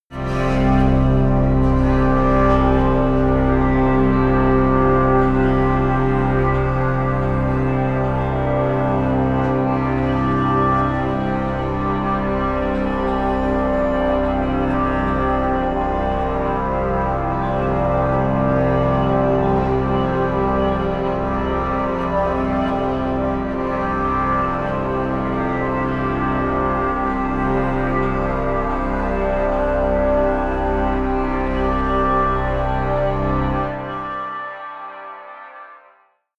drone evocative

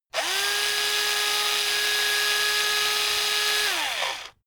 electric drill screw out long; soundpool

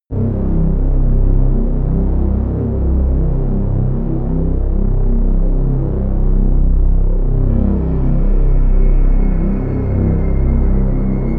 drone erratic hover bass

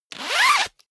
zipper pullover jacket open fast; objekte; reissverschluss

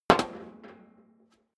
step high heels metal rattle single left; schritte

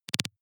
noise clicks splutter low; maschinen; signale; soundpool

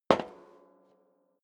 step leather shoes metal rattle single right; schritte

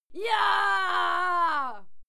female cheer yah; schreie; menschen

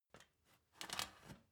step ladder metal scrape single

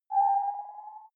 game start dull

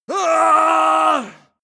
male fight long; schreie; menschen; soundpool

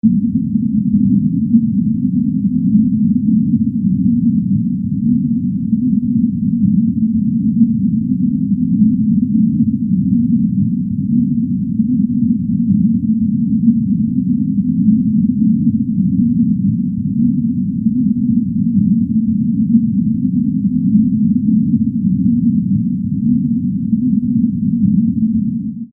atmo hollow noise; atmosphaeren

